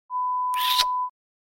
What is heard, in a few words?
Kiss sound